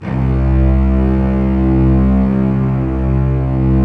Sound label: STR SEC, STRINGS; Roland - LA Composer vol. 1